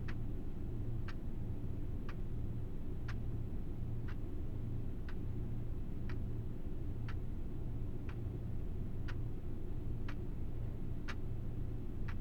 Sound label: indoor classroom; LOOP; anemoi